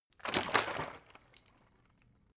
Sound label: bottle water big upend; berufe